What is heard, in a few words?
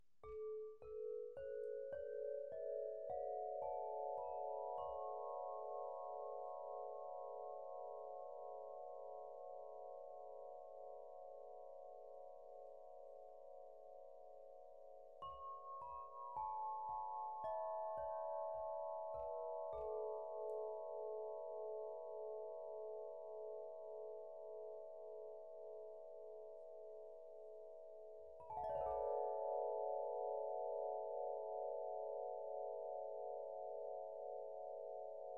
Heavenly Sun A 432hz, Crystal Harp; Crystal-Harp-Ethereal-Wings; Harfa krysztalowa Niebianskie Slonce A